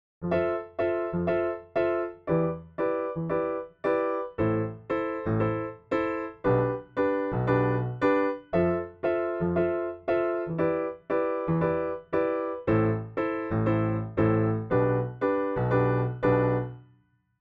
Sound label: damping duration full, piano; Pianoteq